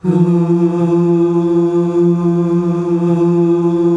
HUUUH F, male choir; Best Service; Hyper Dance

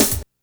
snare hat; Big Fish Audio; Hardcore Breakbeat Action

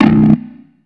Dirt Bass B, One Shot Bass

